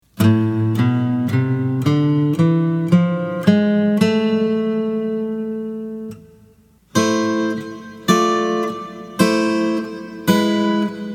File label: NEOCLASSICAL METAL Acoustic Examples; Mastery